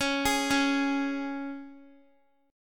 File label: Db 5th Db, down, Guitar; arpeggiated; 4E,4A,5D,5G,5C,6F